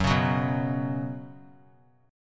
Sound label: Gb Suspended 4th Gb, down; strummed; JGuitar